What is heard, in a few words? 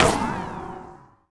hit me armor; Allegiance